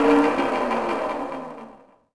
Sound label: crane arm shutdown; machines mechanical